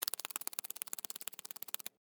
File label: Insect Wings Fly, Steady, Bug; Animal